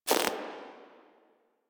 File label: Screen Glitch